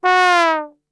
F#2 TRB, Brass Super Section; Best Service; 90 s Sample CDs

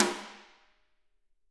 SD, Drums; Bob Clearmountain Drums I

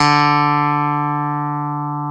clav D3; InVision Interactive; Lightware VOL-1; Instruments & Percussions

